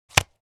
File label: Playing cards poker blackjack dealing single card